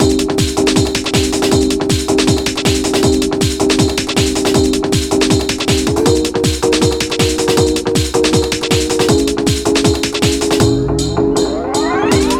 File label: Keytar Solo; fortunes run ost